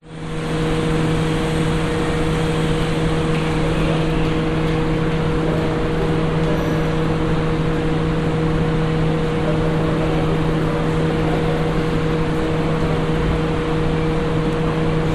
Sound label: public places city back alley extractor hum few people